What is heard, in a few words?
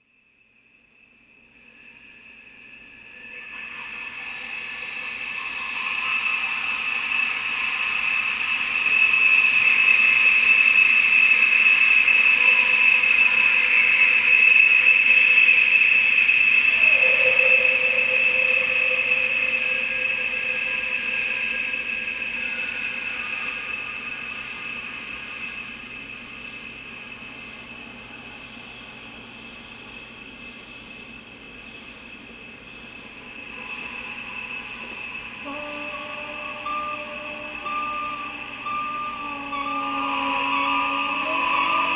noise Harmony; voice+reactive; Noise & Harmony-2 monologue voice+reactive 2008